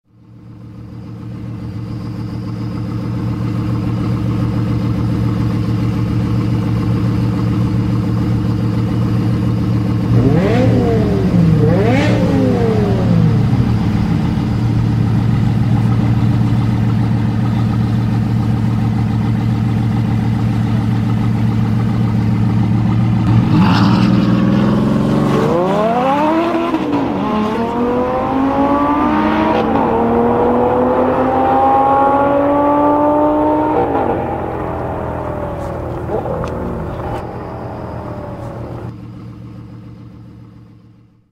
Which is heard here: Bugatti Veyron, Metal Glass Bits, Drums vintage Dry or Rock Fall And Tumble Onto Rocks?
Bugatti Veyron